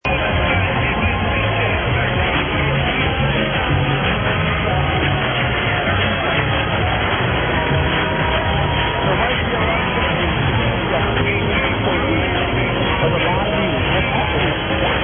fanfare

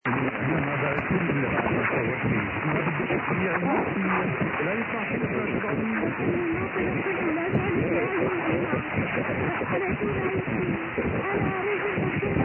Syria

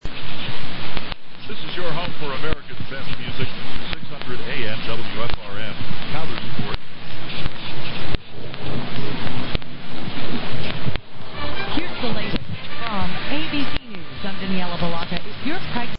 Coudersport PA; 600 WFRM

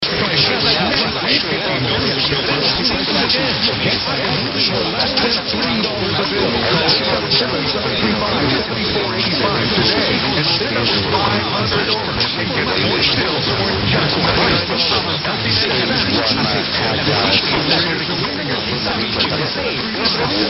Cuba; 770 Radio Reloj

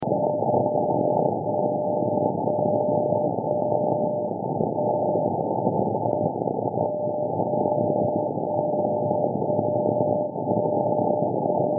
60 Fort Collins CO; WWVB

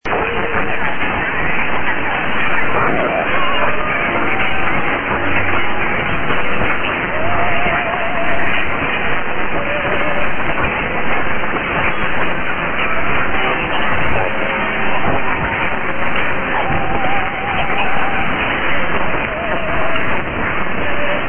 675 Radio; Maria (p) Netherlands

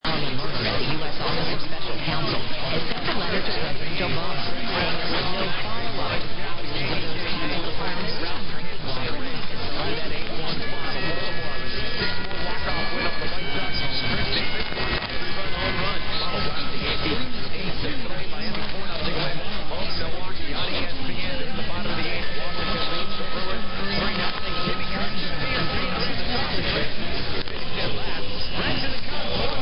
Radio Bayamo Cuba chimes

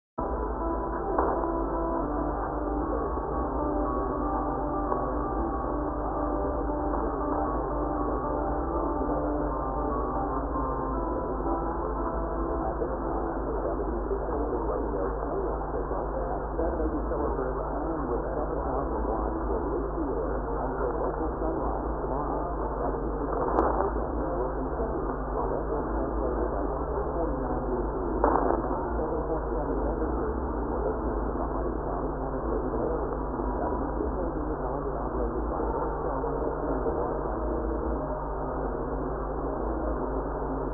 signoff; WCKB Dunn NC

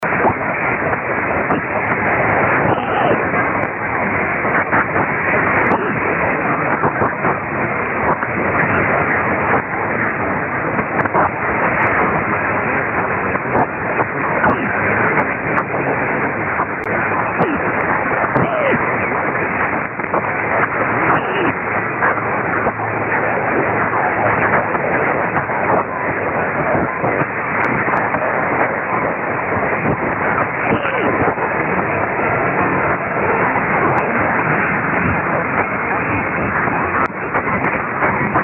pips; 576 BNR Bulgaria

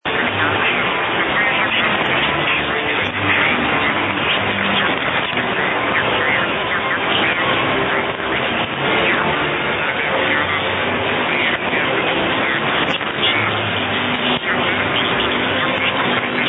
music; 954 Czech Rep 0257 UTC

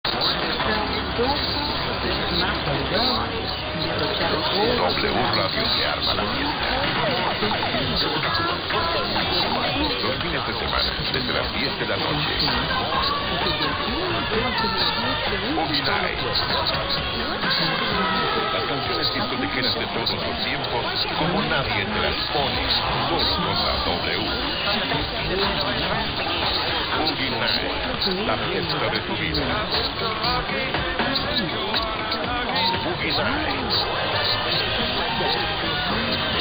Radio Colombia; HJCZ W Radio Colombia

